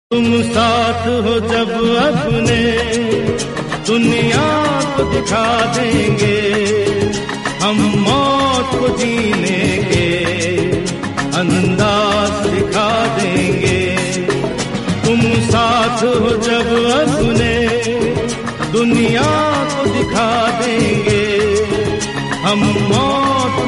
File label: hindi